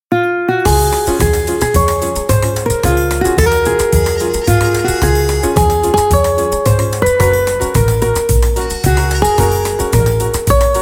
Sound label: Walk, ringtones; Hisense E602M